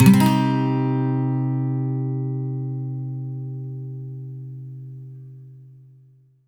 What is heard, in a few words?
MIN SWEEP C, Electric & Acoustic Guitar; USB Soundscan vol.04; AKAI